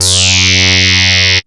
SWEEP F#1; Best Service Alpha Dance vol.2

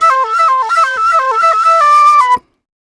Penny 130bpm C, Penny Whistle; African; Quazibeat; African Secret

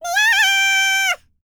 BLACK SEA, World Voices; ILIO; Vocal Planet VOL-5

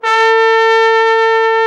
BRA TROMBO, BRA TROMBONE; Sound & Vision - Gigapack II CD 1

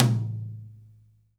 TOM, Percussion; MARKYDRUMS

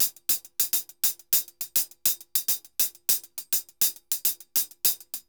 HH, Festa Latina, Drums & Percussion

